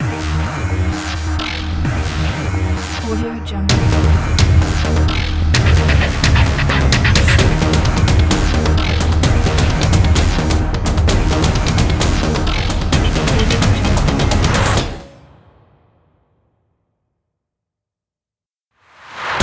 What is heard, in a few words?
epic industrial logo